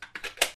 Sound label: Reload